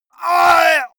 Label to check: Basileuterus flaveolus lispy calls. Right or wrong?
Wrong